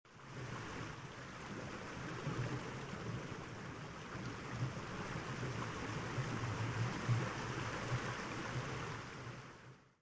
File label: bgm